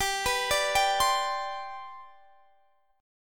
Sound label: G Major Add 11th, G; Gadd11 arpeggiated; JGuitar